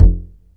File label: plucker, Bass, Notes